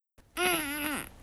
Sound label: grunt